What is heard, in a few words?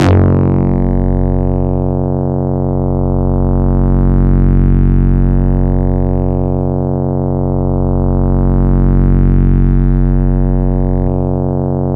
AnalogBass f#2, Yamaha YX81Z